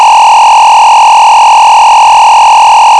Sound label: tone